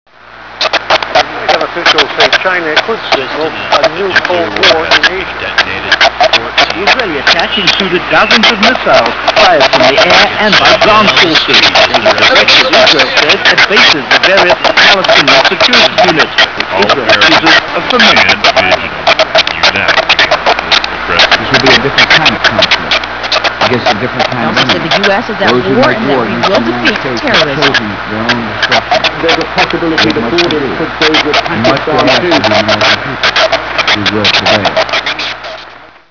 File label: radio; svencoop